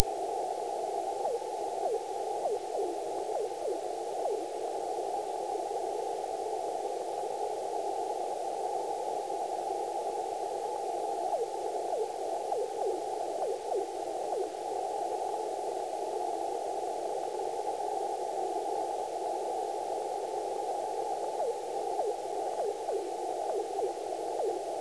vox

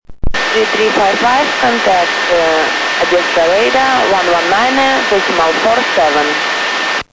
radar; odessa

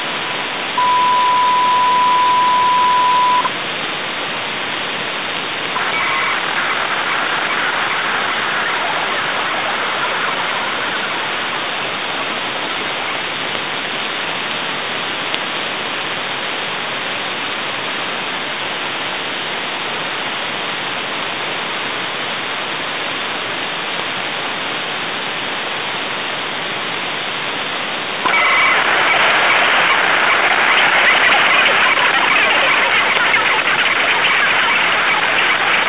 7600khz usb hagelin hc-256 hf vs unid fsk-2

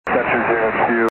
sensor

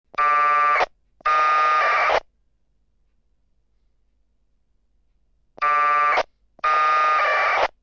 bell202

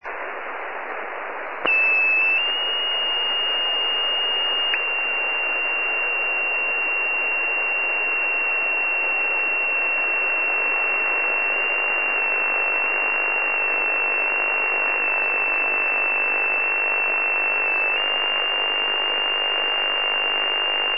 ic 7000 noise lsb

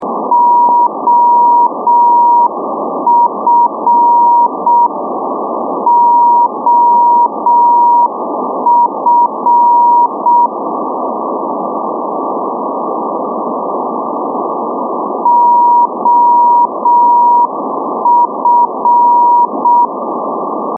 ndb 514khz

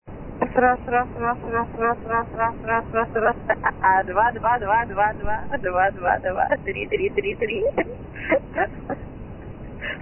miaumiau

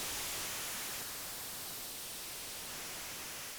msk 48000 bod; sdrsharp; iq signal

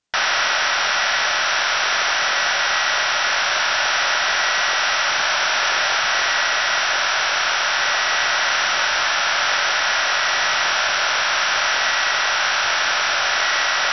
6495 khz ofdm-113 unid; krasnoarsk

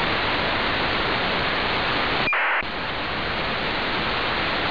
acars vhf

